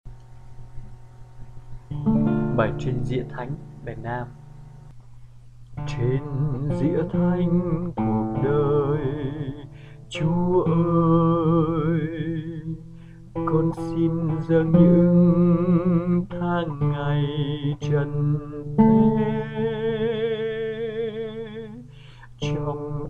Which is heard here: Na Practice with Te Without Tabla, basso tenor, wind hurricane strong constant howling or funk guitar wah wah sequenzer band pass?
basso tenor